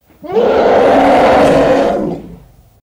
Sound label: boss death; level 4